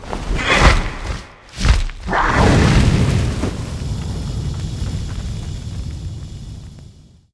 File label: blade peryton attack